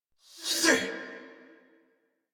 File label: Attack Effort; Warframe; Dax Ashigaru; en